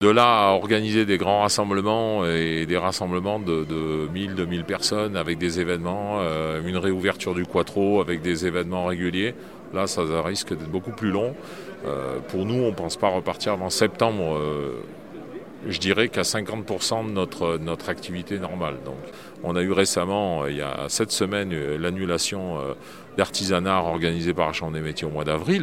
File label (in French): flute; mouvement commercant; bertrand